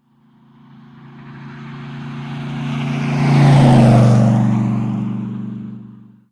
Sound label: truck; Blind Drive